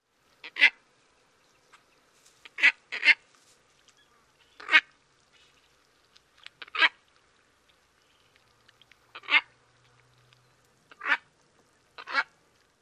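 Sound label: nasal quacks, White-faced Ibis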